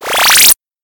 Power Up

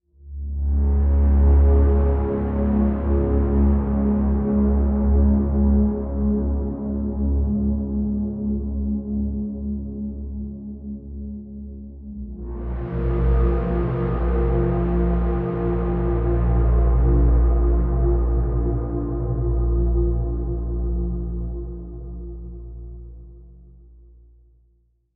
Final Stinger; Eden Rising; ENV Final Stinger 02

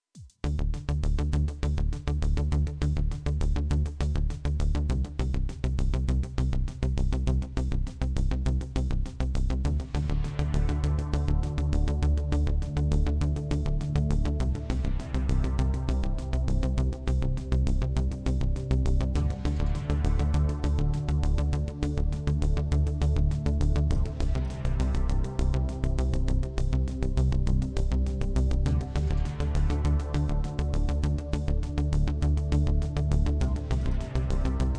Techno Gameplay; Techno-Gameplay-SoundImage

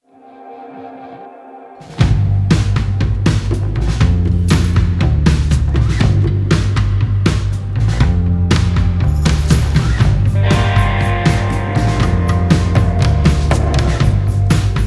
steep-climb; American Fugitive

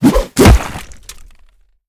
Fishmen Macemen Bulava Jump

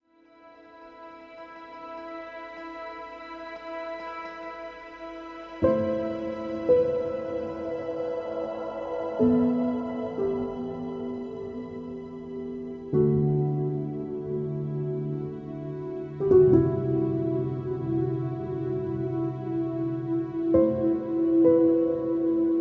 Theme Piano Small; There Is No Game: Wrong Dimension

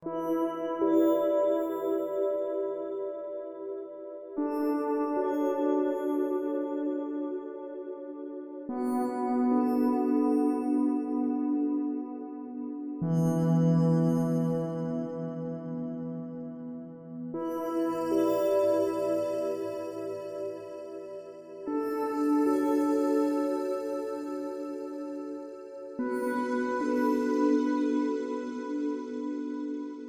Snow; Sexxxnator adult sandbox rpg

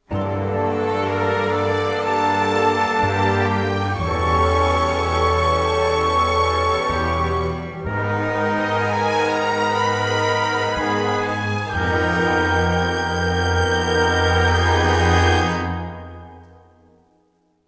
Lush Bridge 124BPM 4-4; Evil Genius 2 World Domination; PS4; Xbox Series X/S

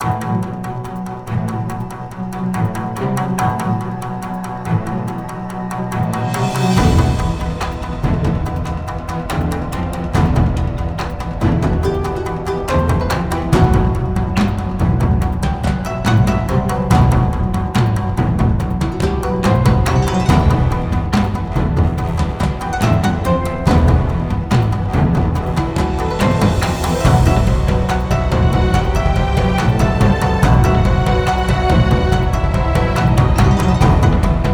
Battle BGM; Castle Morihisa

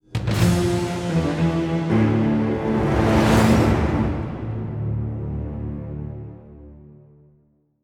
Game Over Music; Ori and the Blind Forest

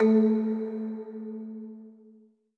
marimba; Sky Children of the Light gamerip

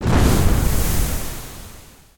Splash Big Rumble Noise (Stereo); android gamerip 2013; Smashy Road

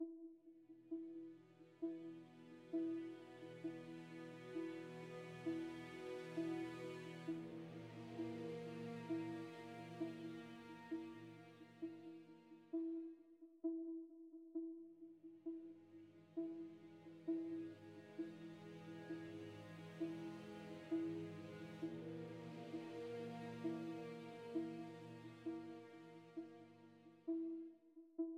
the cave music start; fujii ps4 windows gamerip 2019

